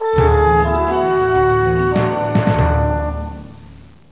suonerie classica; musica classical

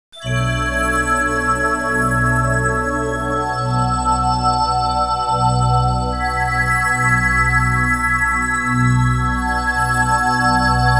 audio suonerie musica mistica

